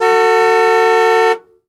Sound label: Jeep Cherokee Horn Honk; jeep cherokee 84